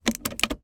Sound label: car automatic gear stick move engine off daewoo nexia automatic